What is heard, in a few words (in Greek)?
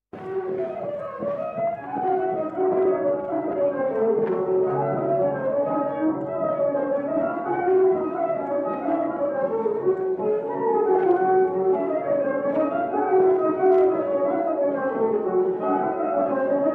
Σηλυβριανό Συρτό; 1927; Columbia (U.K.)